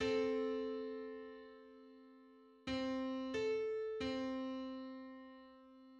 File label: Hundred fifty third subharmonic on C